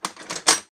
lock open, wood metal; wood metal SFX

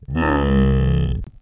Voice, beast or animal

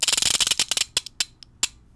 Ratchet Harsh; bb - Smol Mechanisms

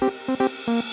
Reverse Cymbals, Drums; BB 2HTC Samples Vol 4

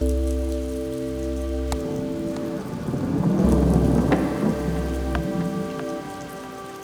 Rumble Pad; BB 2HTC Samples Vol 4; Pads

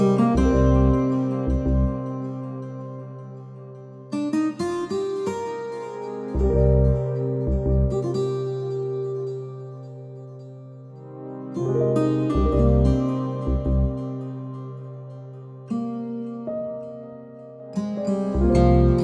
Pluck Guitar; Solos

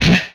Gun, retro arcade; Guns

